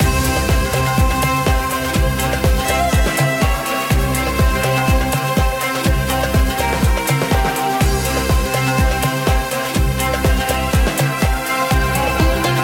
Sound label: freespin melody; Amatic; Eye of Ra